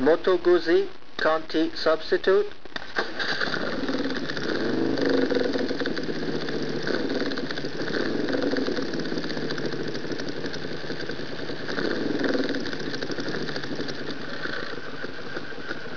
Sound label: guzzi conti